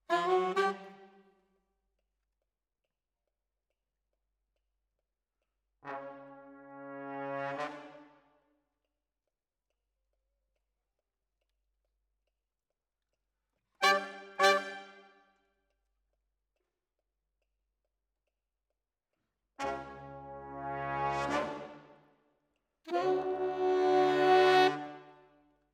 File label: Sax